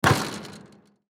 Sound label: Basketball Shoot Miss Hit Rim Rattle; Basketball Shoot Miss Hit Rim Rattle Light